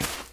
grass step; Golden Crayon Sounds

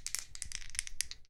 Shake, Zombie Dice; RS Games Sounds

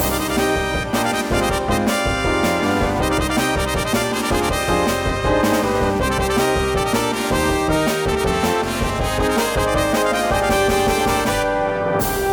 battle; Manamon Music; Manamon 1

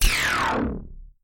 double word bonus; RS Games Sounds; Scrabble

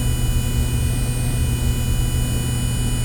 ultrabomb generator loop; Original TK Sounds

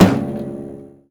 wall instrument; Golden Crayon Sounds

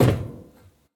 metal step; Golden Crayon Sounds

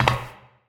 Road Walking; finn's sounds

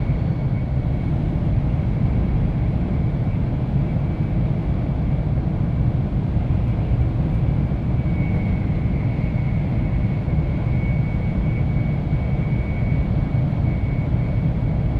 room tone; Golden Crayon Sounds

